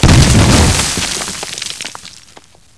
crumble; svencoop; schall; ambience

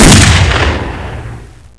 aug, gunshot; mommamesa

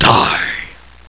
zombie attack; svencoop; hunger; zombienew

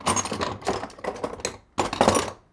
repair sound; svencoop; th escape

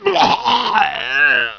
death; fgrunt

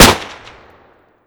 shoot, greasegun; svencoop; hunger; weapons

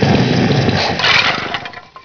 die; hunger; hungerslave

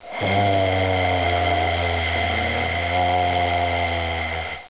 die; svencoop; hunger; hgrunt

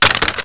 weapon drop; svencoop; items

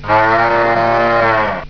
bull idle; svencoop; hunger

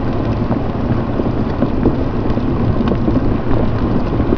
bubble; svencoop; hunger

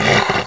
gonome pain; svencoop

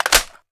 m16 mag in; svencoop; hunger; weapons; m16a1

